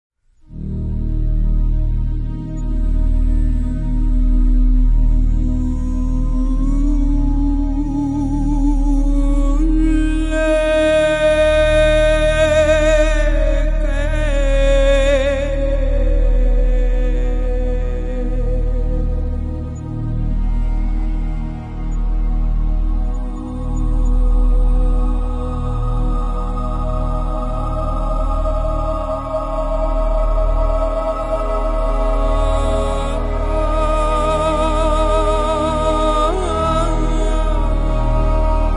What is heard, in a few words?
Guardian (Vocal)